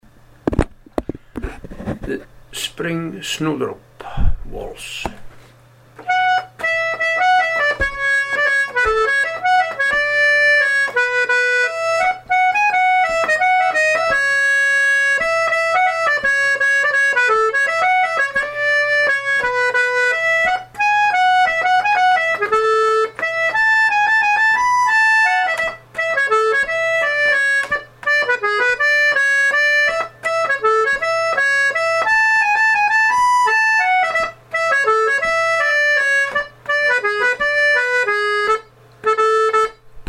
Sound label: spring snowdrop waltz